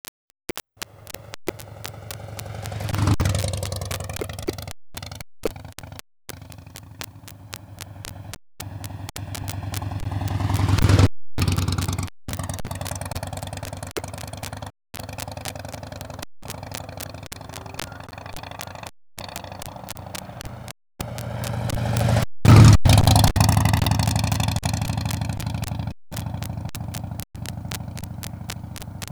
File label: Harley Davidson